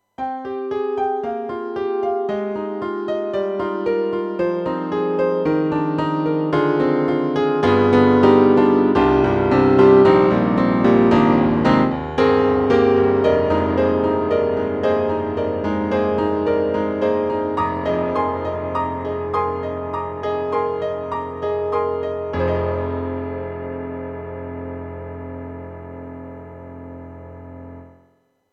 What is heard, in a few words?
Yamaha MU500 (GM2) Grand P